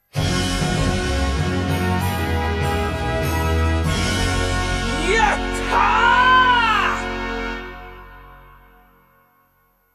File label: Stage Clear vapor; Astal; vaporwave